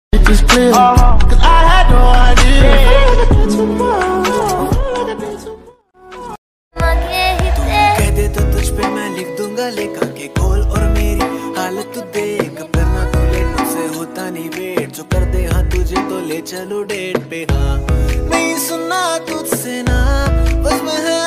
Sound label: hindi version ringtone, male version ringtone